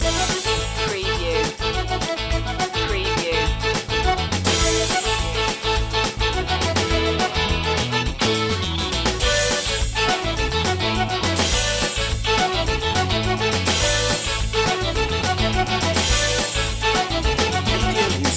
alligator stomp loop

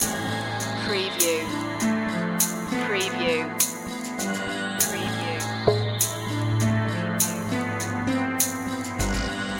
indianshop loop

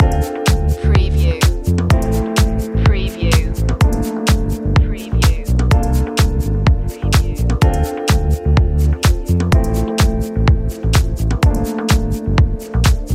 funkalectual loop

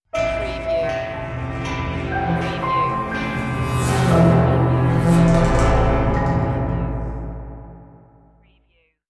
Dark Production Stinger

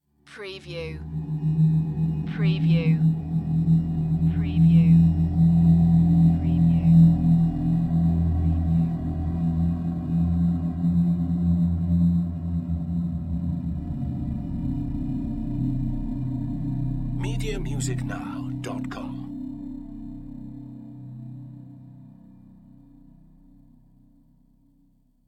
Drone Ghostly Reverb; Space / Science Fiction (Sci-FI)

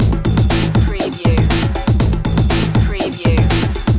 unnatural science loop

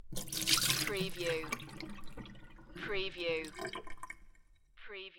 Pour water into sink, water flows down drain

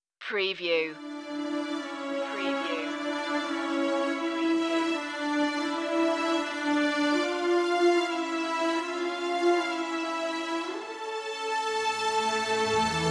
strings only

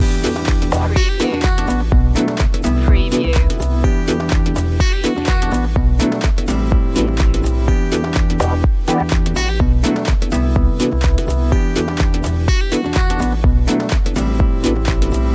loop, chill

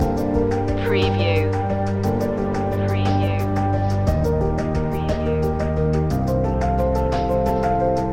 motion loop

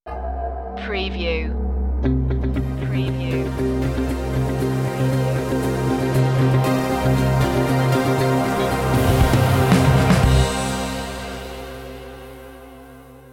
fighting spirit stinger

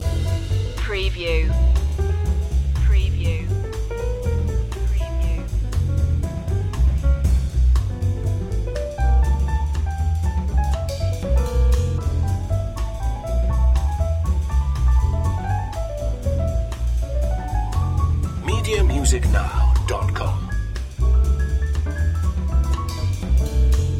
swing loop

